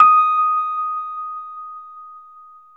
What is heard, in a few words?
Rhodes 73 Slow, D#; E-MU Producer Series Vol. 5 – 3-D Audio Collection; 3DRhodes